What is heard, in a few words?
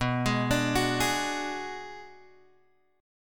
B Minor 6th B, Guitar Drop D